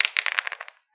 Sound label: Rolling Dice